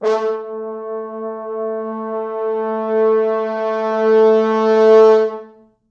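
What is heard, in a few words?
4 French Horns, Horns; A2; East West; Quantum Leap Horns Sax; 4 FrHorns MOD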